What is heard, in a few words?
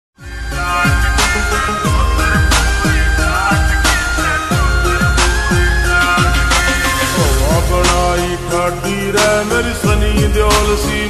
bgm ringtone song ringtone